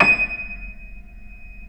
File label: Keyboards Acoustic, D#6; USB Soundscan vol.17; AKAI